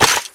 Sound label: rocket reload; tf2classic